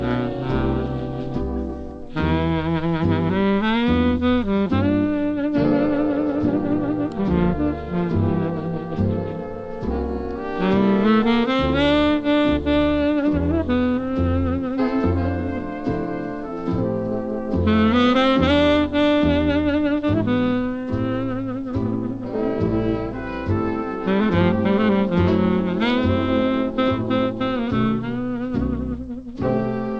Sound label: jazz